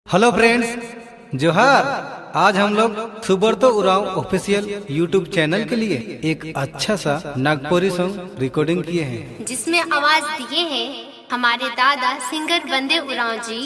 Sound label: nagpuri song, sadri dhamaka song; Upcoming Hit Nagpuri Song; New Nagpuri Song 2025; Dj Nagpuri Songs